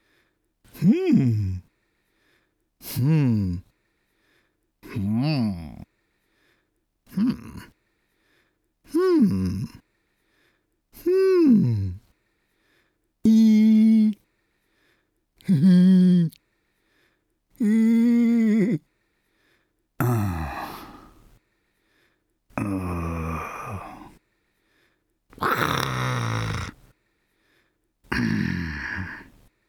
Hmms Errs Eees And Groans

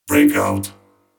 Race Robot Breakout

